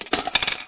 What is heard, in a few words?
win